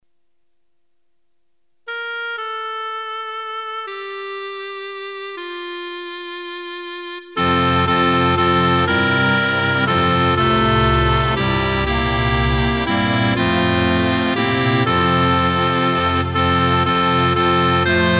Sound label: instrumental